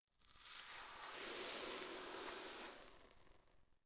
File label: Desert Sand, Ambient; Civ4